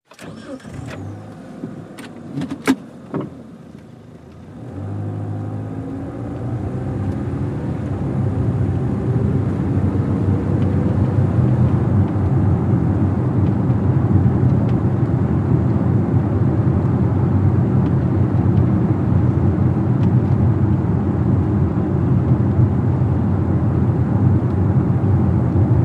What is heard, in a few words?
Sedan Engine Accl St